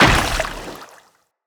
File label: Moa Combined Step Water, Footsteps